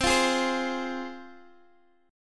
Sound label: F Minor, C